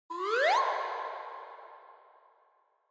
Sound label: Slide Whistle Reverb